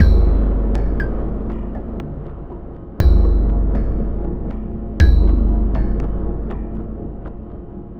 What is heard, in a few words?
Percussive Bass; Sonic Foundry (Sony Creative Software); Modular Electronica; Basses